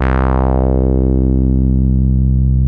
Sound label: BASS C2, Analogon